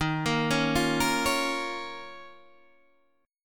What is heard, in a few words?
D sharp 7th Suspended 2nd, Guitar, Open D